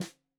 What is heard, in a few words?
Snare 65 Reg Stereo, Deeply Sampled Drums